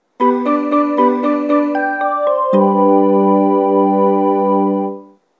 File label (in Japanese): 発車メロディー, 中央線 駅放送; 発車メロディー(1番線)